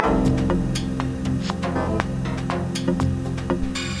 wave

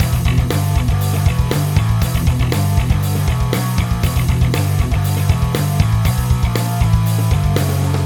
BASS

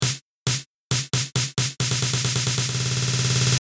drum EDM nomal SN

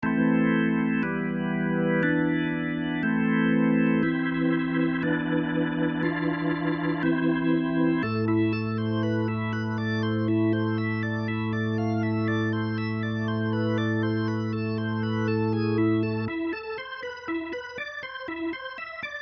Full Drawbars A; SampleTank 3

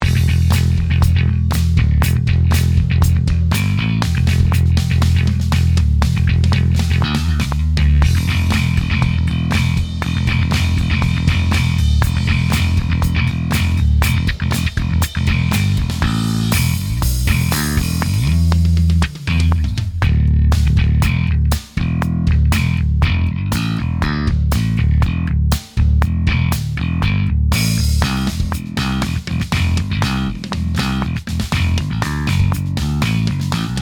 SR5 Rock Bass

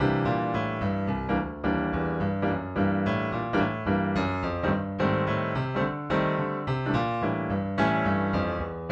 piano dry

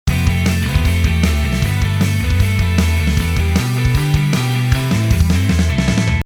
Guitar

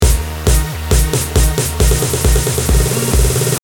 drum EDM

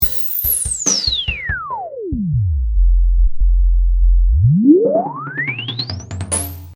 Synth Warmer Emphasis

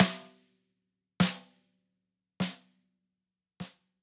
Snare Hit

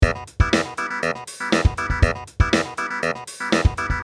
clav 16beat

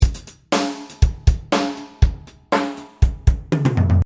Dr loop; LOGIC

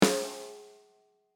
Sn mix

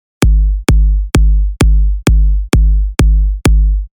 kick comp

